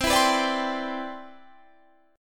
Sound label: C Major 9th, Guitar Drop D